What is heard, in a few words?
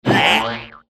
rubber duck; cheesy-themepark; dontWhackThePack